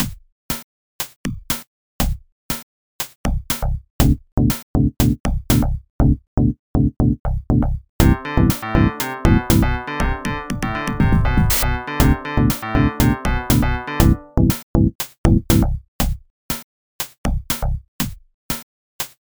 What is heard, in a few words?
funky dance